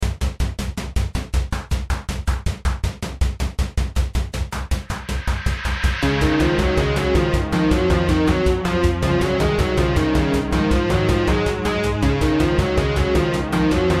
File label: battle